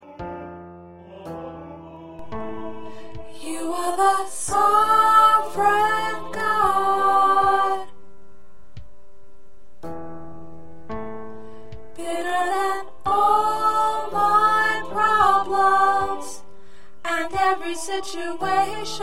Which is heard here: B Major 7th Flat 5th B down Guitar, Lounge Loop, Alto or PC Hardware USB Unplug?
Alto